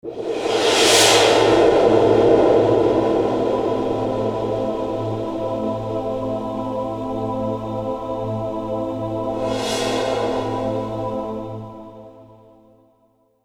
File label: Orchestral Phrase; FXHome